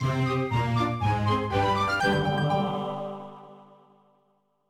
Sting Level Up; Mystery Case Files MillionHeir; nintendo ds

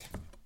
Cutter; Cherricar

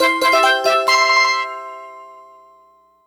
All Star Coins In World Collected; New Super Mario Bros. U Deluxe

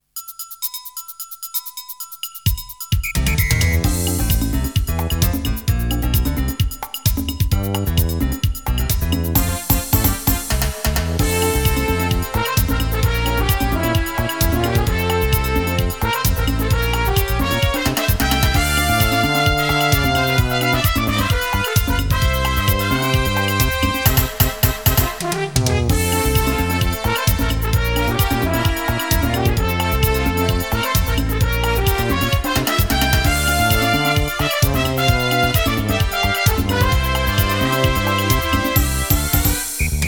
Samba; Akumajo Dracula X

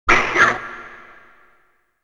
Enemy Voice (Insects, Fish)

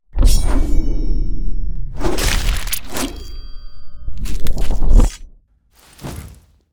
slowmo; yurei

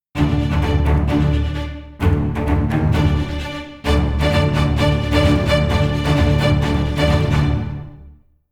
Combat In; Novigrad

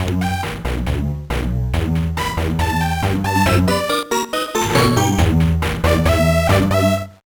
Bathroom Jam

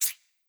map events choices move

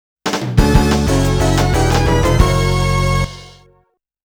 Fanfare; Namco Classic Collection Vol.2; arcade soundtrack

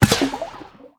Bird Enter Water

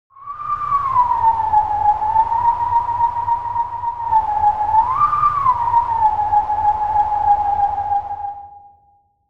Wolf Song (Howling Stone); Wind's Requiem

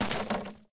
Wood Crack; Doodle Jump 2; android

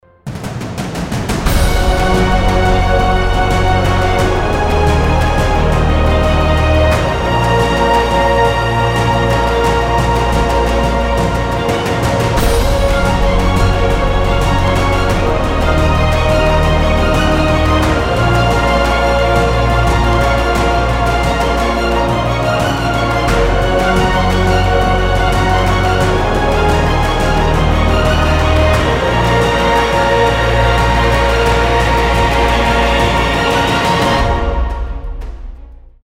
Victory Epic

